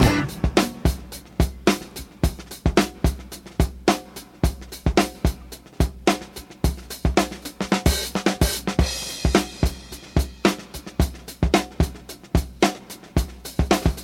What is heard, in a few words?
109 bpm modern breakbeat sample c key, Free drum beat - kick tuned to the C note